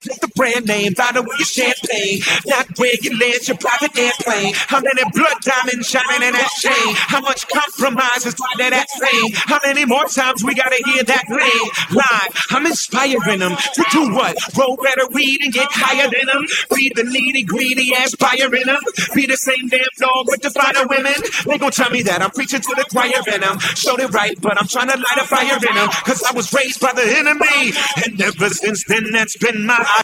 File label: vocal